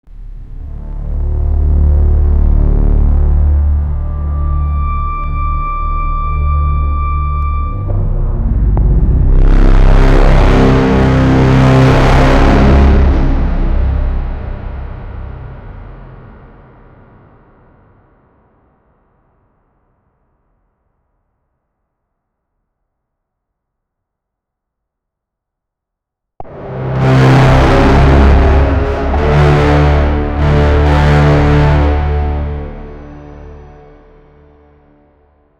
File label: soundtrack for the boss, boss battle